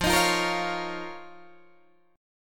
F 7th Suspended 4th Sharp 5th F, Guitar, DADGAD

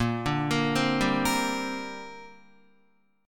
A sharp 6th Add 9th, Guitar; A#6add9 arpeggiated

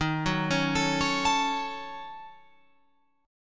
D sharp Minor 7th Flat 5th, Guitar, Open F; arpeggiated; 15,13,13,16,16,16; chord

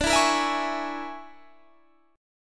Bb 7th Sharp 9th Flat 5th D

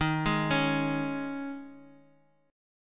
D sharp 7th Suspended 4th, Bass Standard; arpeggiated; x,18,18,18; JGuitar

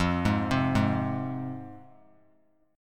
F Minor F down; Fm arpeggiated; JGuitar

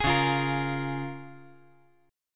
Db 6th; Db6 strummed; JGuitar

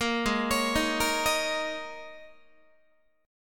Ab Suspended 2nd Suspended 4th Ab down Guitar; arpeggiated; JGuitar

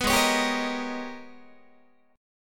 Ab Minor Major 11th, Guitar; strummed; JGuitar

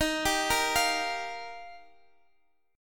Eb Major Add 9th; Ebadd9 arpeggiated; 20,19,18,20; JGuitar

